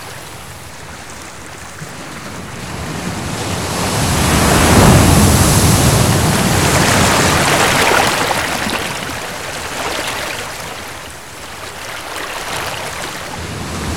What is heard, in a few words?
Ocean Surf